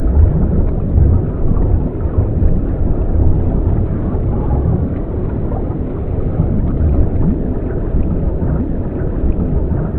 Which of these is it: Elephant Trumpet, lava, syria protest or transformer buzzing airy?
lava